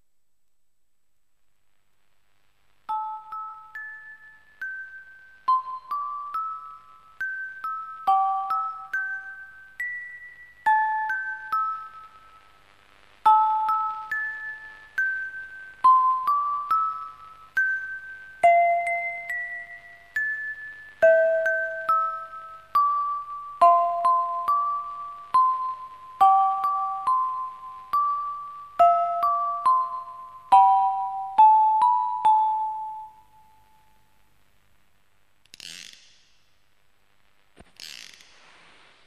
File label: mannequin orgel; hobosha